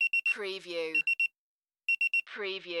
Pager Beeps Alert

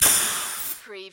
Pneumatic Valve

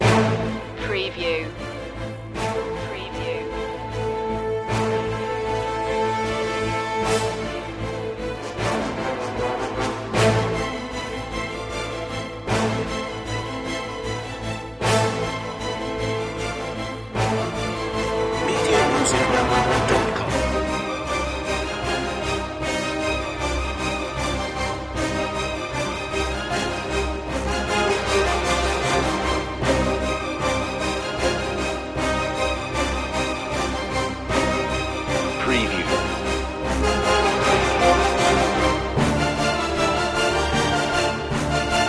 no choir perc

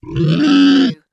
Monster Whine Pig

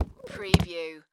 Trunk Lid Close

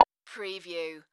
Multimedia Percussion

